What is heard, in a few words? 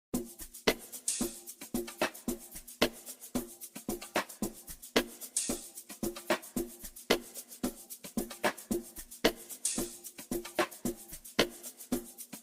Remix Freestyle